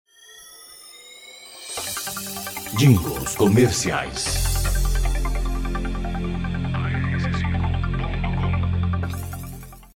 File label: jingle